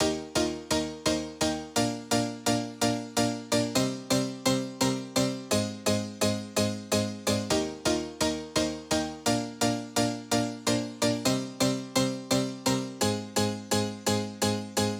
rave piano, 128BPM

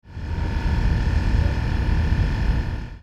bark

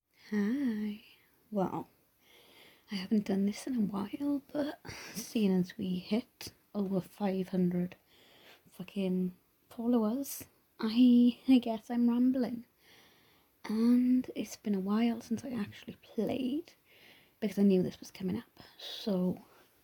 Moans; F4A; Wand Play; Stumbling; Begging; Stuttering; Wet Sounds

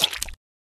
slosh; Lewd SFX

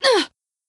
Grunt; Lewd SFX

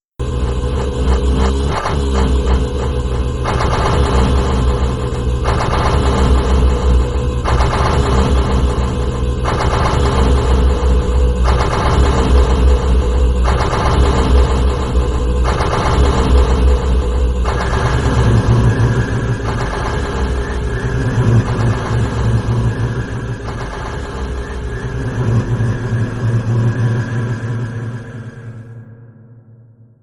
Snort Groan Whinny Neigh; nightpon3

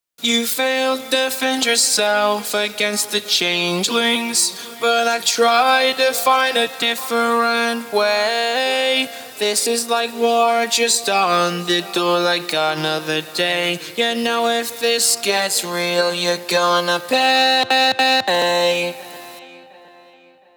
Vox, 105 BPM

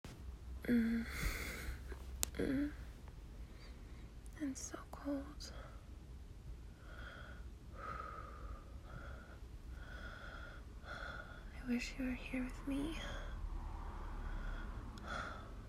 Morning moans

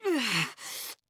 Grunt; Lewd SFX

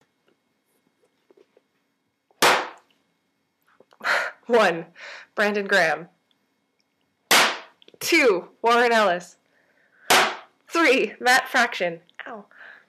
Comical Spanks

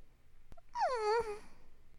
Moan

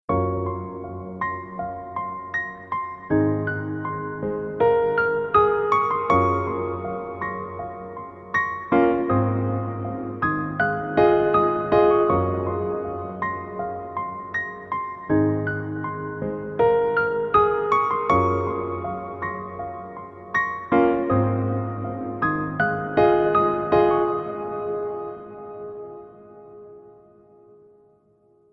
Reverb on da piano

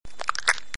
Suck; Lewd SFX

